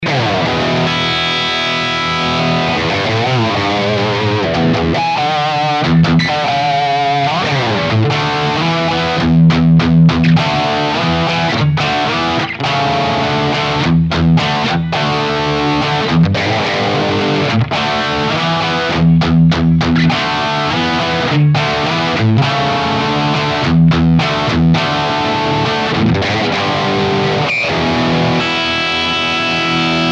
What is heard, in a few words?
Les Paul LPM bridge high gain; gibson les paul lpm 2015